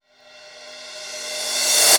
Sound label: Reverse Crash, Cymbals; Vengeance Samples